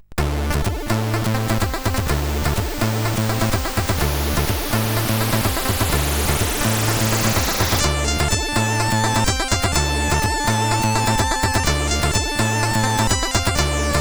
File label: Intro, sid, CSG8580R5